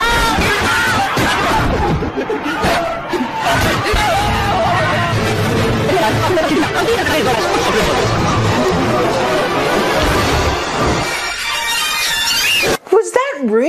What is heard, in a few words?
goofy alarm; eas